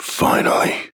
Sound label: Owl Death; kinessa; Paladins